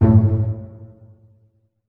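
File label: string pizzicato A1 mezzo forte left; Sound On Sound - Peter Siedleczek's Orchestra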